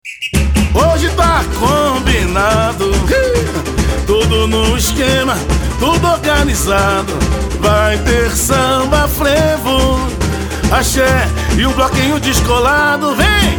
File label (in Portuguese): samba sem vcl; ms aids ta combinado